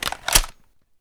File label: Inf Weapons Foley; Red Orchestra Ostfront 41-45